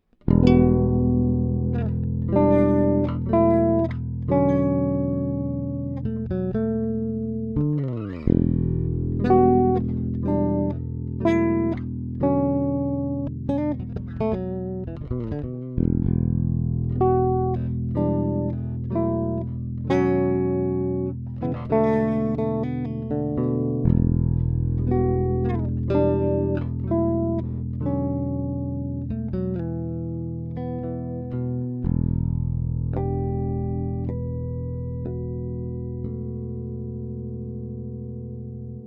Chords n Harmonics; KIngfisher

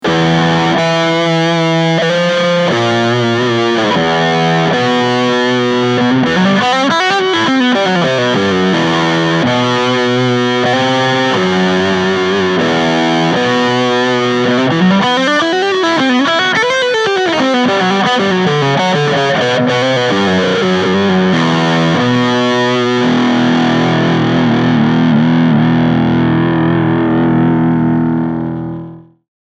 SL OD Ana Delay

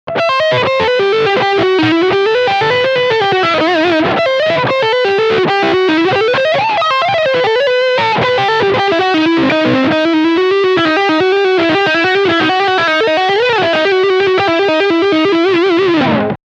Fronthumbucker Lick Distort

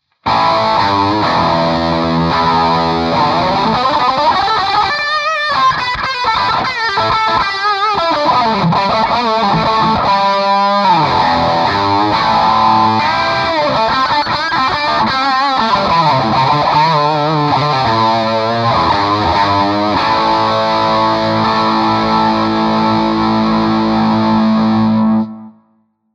Amplitube Max Lead Sound

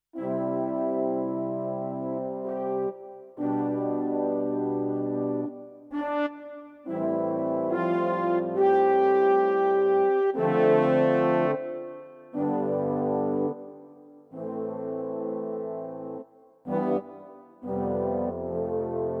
CVP609 Horns

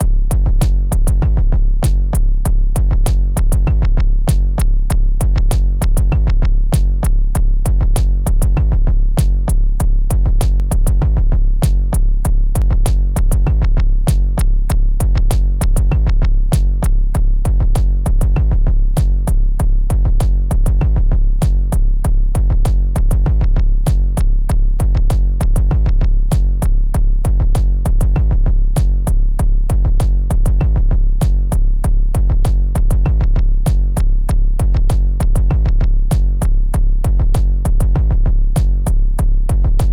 RAW Kick

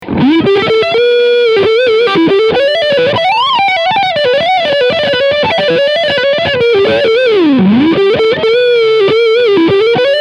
Lead Front HB

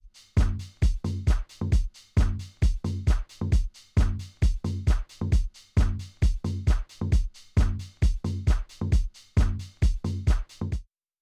Reloop Concorde Black Loop

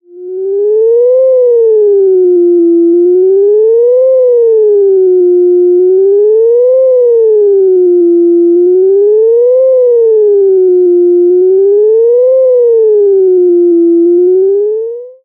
Klavis Module Fm Mod Step Integer Artefakte

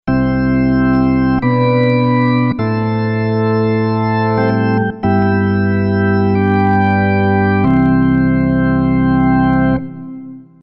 Crumar Mojo Desktop; Amazing Day

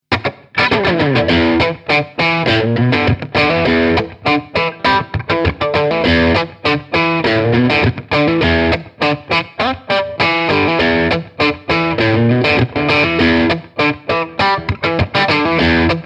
Harley Benton Tube 15 Strat Crunch

